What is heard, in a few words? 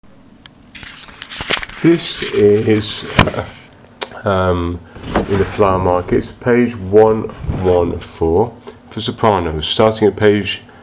soprano; My Fair Lady